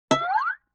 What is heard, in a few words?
Guitar Plink Slide